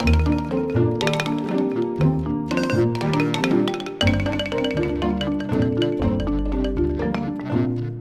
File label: classic xylo; music world